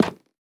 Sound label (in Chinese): 樱花木 活板门 开关声